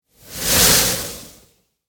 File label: Whoosh Bright Wet, Whoosh, Bright, Wet, Accent, Transition; Production, Element, Imaging; BlastWave